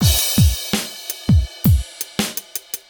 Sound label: beat 1 with crash